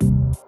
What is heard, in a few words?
Donk; BB 2HTC Samples Vol 4